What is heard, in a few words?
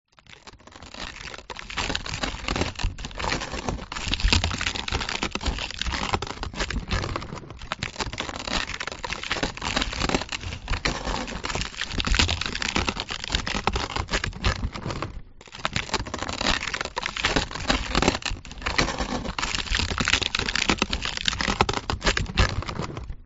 ice wall cracking